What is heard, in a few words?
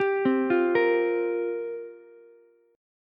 F Suspended 2nd Suspended 4th F down Ukulele; arpeggiated; JGuitar